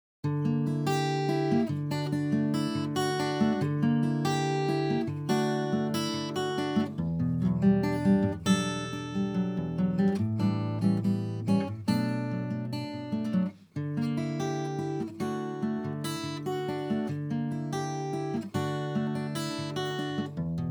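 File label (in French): guitar original, guitare acoustique, son d'origine ; un petit thème de gratte Folk bien joué et bien pris; en mono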